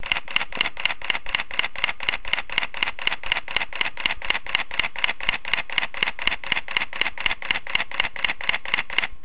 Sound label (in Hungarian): sorozat, Nikon D5000